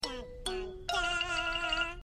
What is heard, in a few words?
ta ta taaaaah